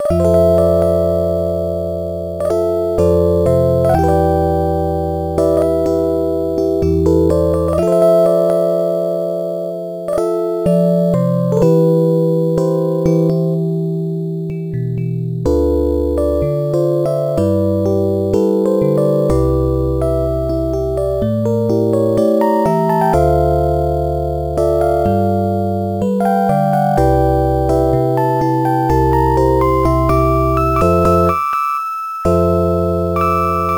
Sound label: arcade; out run; last wave